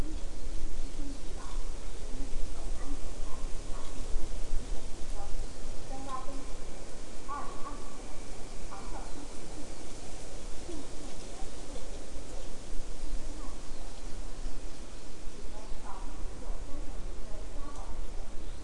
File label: mixture of biophony anthrophony